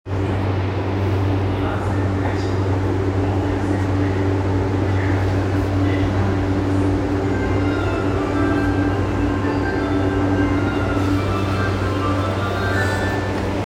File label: train sounds; 南北線王子南